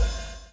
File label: BLOW; Spectrasonics - Backbeat